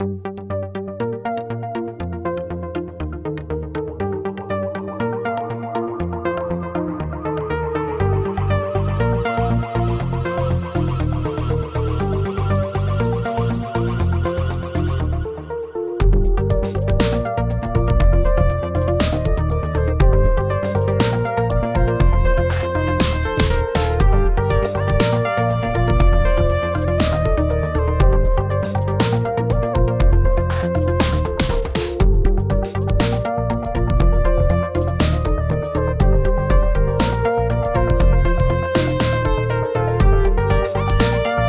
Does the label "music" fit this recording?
Yes